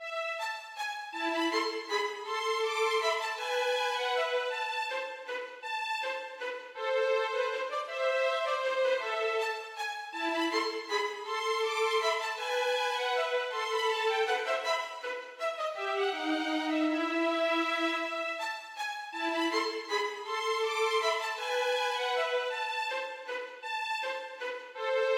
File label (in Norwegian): Menuet for fiolin duett; Aamodt